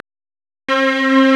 STRNG; CMP - Analogon

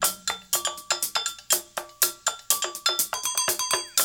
TONALOOP, PERC RUN; Crypton - DrumBase5000

